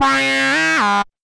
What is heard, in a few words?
Beat; Beat Sketcher